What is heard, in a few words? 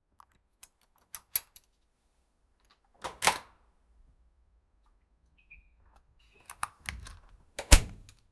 safe unlocking